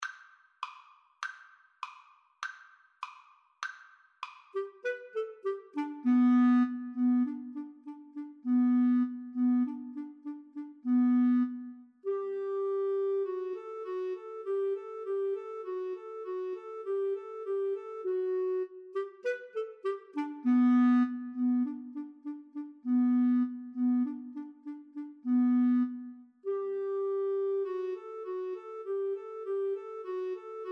Clarinet-Oboe Duet

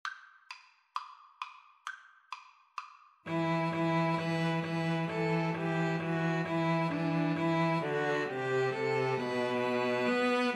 god rest, string trio

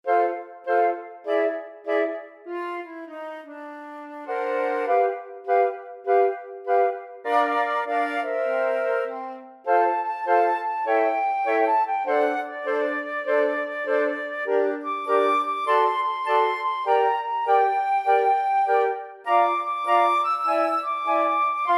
flute choir

